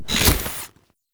Turret Slow Close; Warframe; Zariman

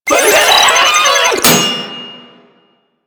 Proto Infested Volatile Runner Explosion Warning; Enemies; Abilities; Warframe

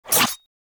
Harpoon Gun Mech; Warframe; Sharkwing; Grineer Harpoon Gun

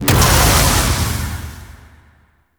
Feast Impact One; Warframe; Revenant

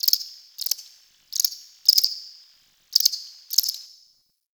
Cricket; Warframe; The New War; Ambience; Zariman

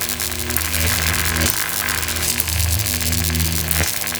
Prod Loop; Characters; Warframe; The New War; Veso

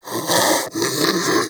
Armadillo Vocal Breathe; Warframe; Characters; Hunting Critters

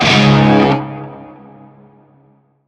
Shawzin Chromatic Chord B; Music; Warframe; Narmer

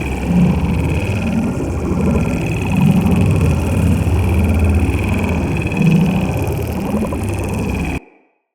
Bubble Shield Loop; Enemies; Captain Vor

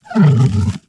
Tame Sleep Idle End Stretch; Warframe; Kubrow

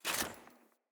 Foley Action Armour Walk; Player; Deora Armour

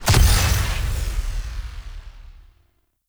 Energy Ball Explosion Burst; Warframe; Corpus; Mine Osprey

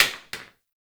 Plastic Broom Drop

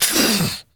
Injured Grunt

